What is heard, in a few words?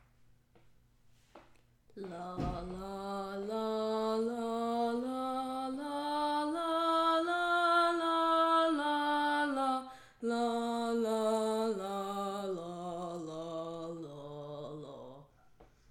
Tenor; allstate2024 jrhigh; Audition